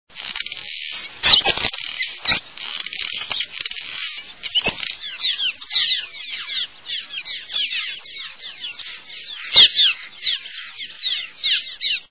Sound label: Cliff Swallow